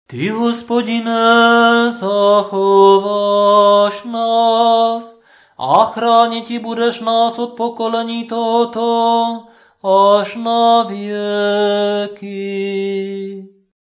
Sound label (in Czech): Nedelni prokimen hlasu